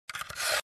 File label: Scratching on surface